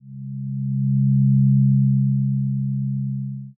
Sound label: zero g